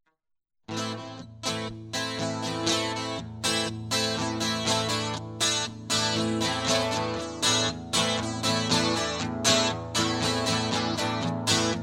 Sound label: Guitar Loop